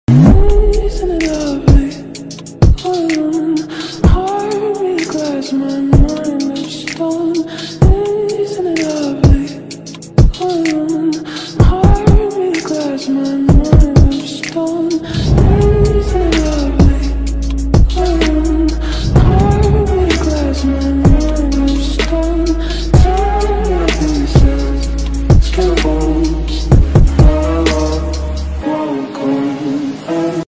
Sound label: attitude ringtone bgm ringtones english ringtone